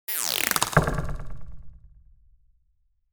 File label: strech sms